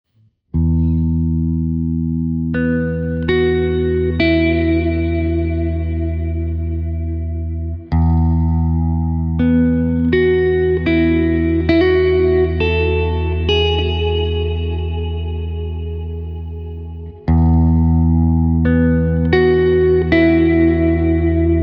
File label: megaverb